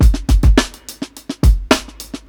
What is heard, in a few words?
105 16TH LINEAR BEAT, DRUMS LOOPS; BASEMENT DRUMS VOL 2